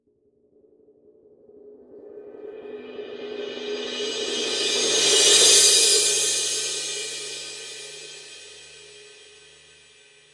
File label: 19 reflector heavy full crash roll; signature